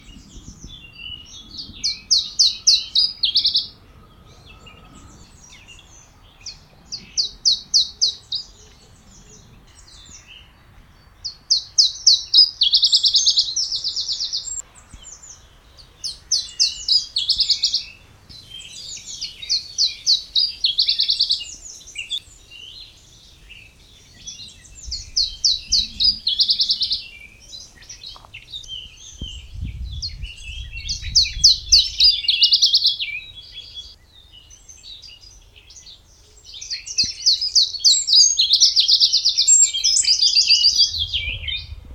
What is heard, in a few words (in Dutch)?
iberische tjiftjaf zang